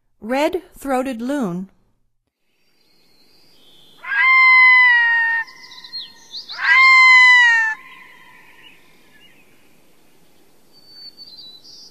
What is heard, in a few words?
Red throated Loon; North America; Stokes